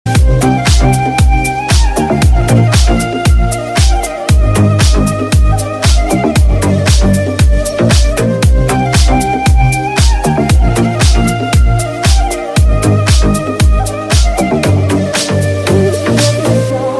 deep house

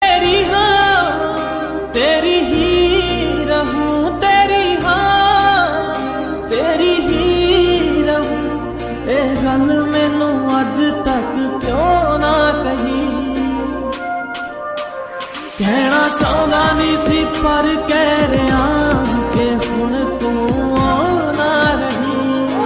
sad punjabi

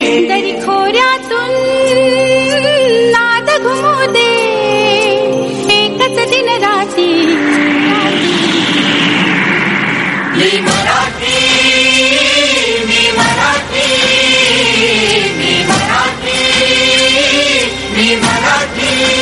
marathi tone

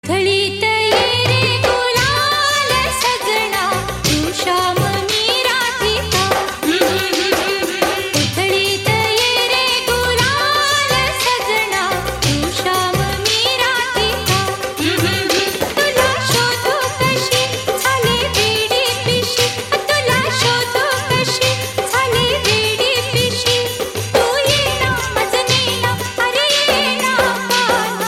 marathi tune

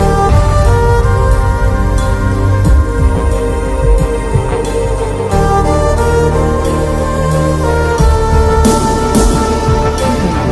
Telugu tune ringtone

